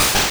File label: Double Slap; sfx; attack moves; pokemon; gen 2; gsc